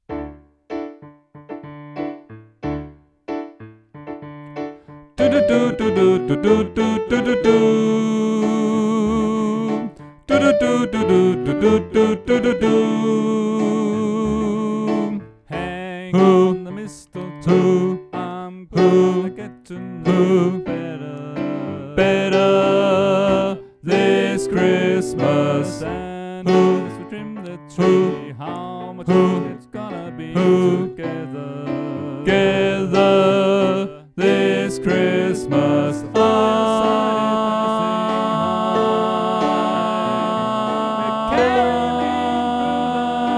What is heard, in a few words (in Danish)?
Sopran 2; Stephans-koret; oevefiler